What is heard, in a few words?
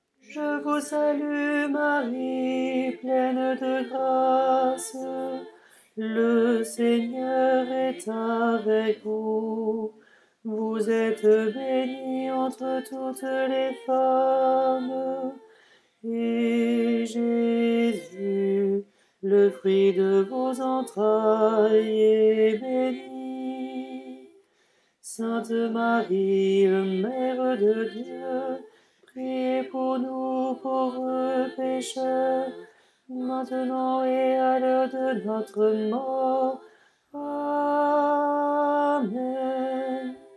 tenor; je vous salue marie